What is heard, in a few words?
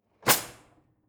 drawer cutlery close draw short hard